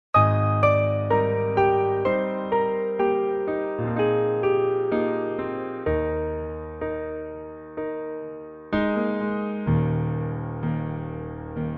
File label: piano